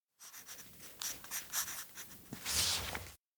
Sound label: diary write; anemoi